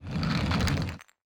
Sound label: chests shulker open; mcl chests